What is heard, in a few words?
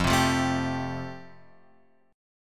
F Major Add 9th F down Guitar Open F; Fadd9 strummed; JGuitar